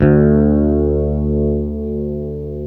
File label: D2, JAZZ BASS